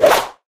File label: pickaxe swing; tf